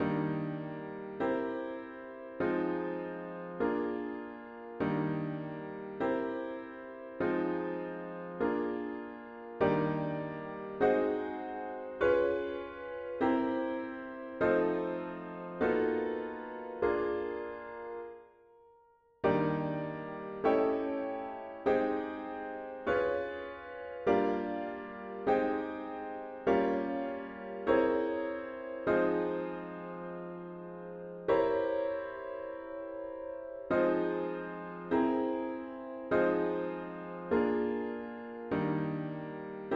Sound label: chords only